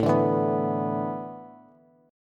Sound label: A Suspended 2nd Suspended 4th, Bass; Asus2sus4 strummed